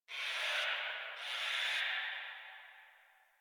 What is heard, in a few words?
Tree Distant Critters; Warframe; Duviri